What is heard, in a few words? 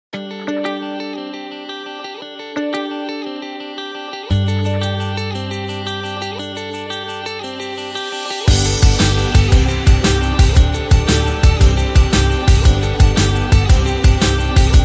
Bronze Snare Short